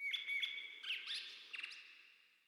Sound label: terrain Ambient birds